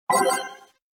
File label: chime